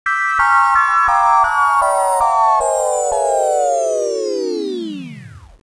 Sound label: Gameover